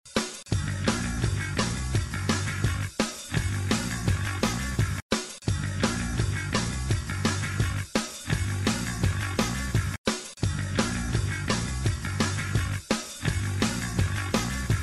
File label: rock bass and drums